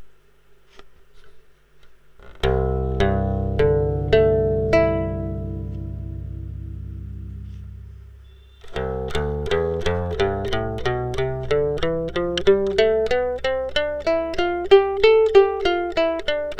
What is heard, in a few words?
Guitalele nylon CGDAE PREAMP